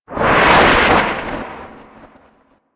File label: Berserker Barrage End; Warframe; Warframes